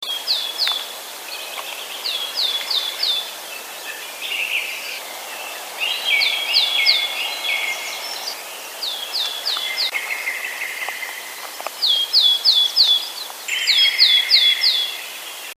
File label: Poecile montanus